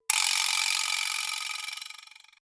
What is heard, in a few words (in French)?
LETTRE; MOBICLIC161